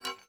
pickup metal medium rattley; Rust

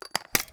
attack end; Rust

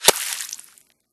water; Escape from Tarkov

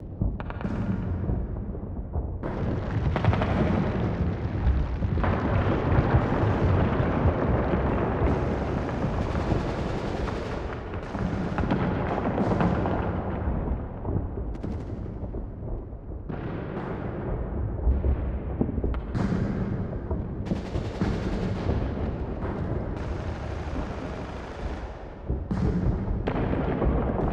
city at war, ambient; War Thunder

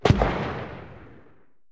grenade launcher far; cannons; War Thunder

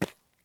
run asphalt; Escape from Tarkov

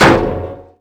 mine impact

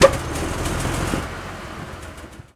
exterior engine switch, tanks; War Thunder

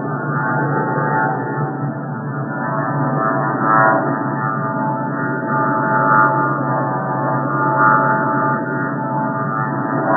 Aircrafts Engines Engine TF34 GE100 Fan; DCS

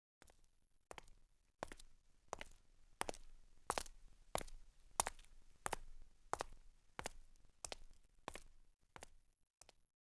Footsteps; Civ4 warlords

